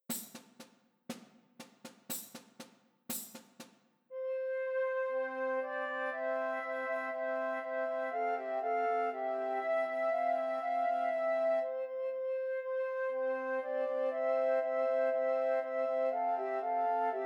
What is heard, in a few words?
Three Descant Recorders